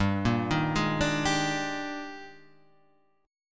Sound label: D sharp Major 11th, G, guitar chord